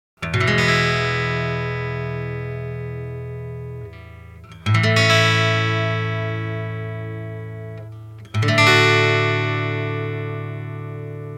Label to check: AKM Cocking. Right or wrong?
Wrong